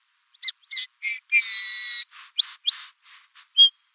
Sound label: song sparrow less attractive; by stephen nowicki and susan peters